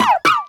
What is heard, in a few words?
Rockruff cry; Pokemon